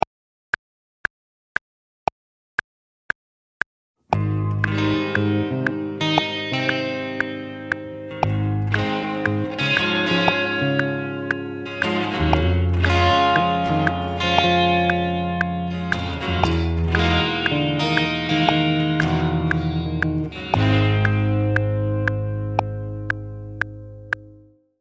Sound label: Improv 8 Bar Jazz Groove Based